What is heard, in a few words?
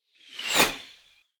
arrow shot